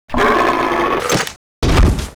die; SNATCHERBAIT; Mob

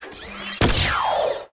GRELAUNCHER BOTTOM, Weapon

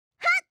FEMALE attack

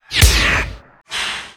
exertion

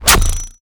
Thow Skin

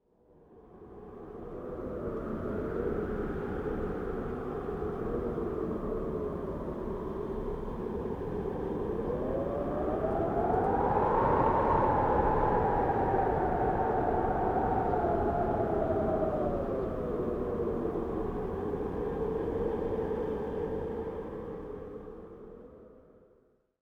Horror wind, ambience

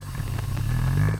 STONE BLOCK WAR STAND

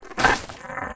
WAR DAMAGE; GRAVELAPIS

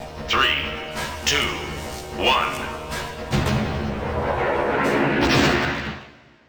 Missile

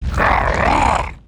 WAR ATTACK

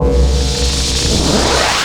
Aiming Launcher

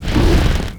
SIZZLER WAR DAMAGE

